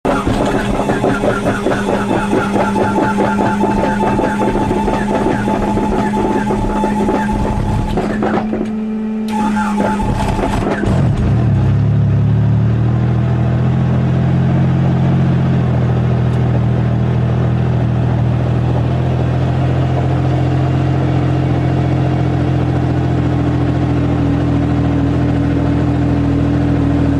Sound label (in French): Bell 47, demarrage aeronefs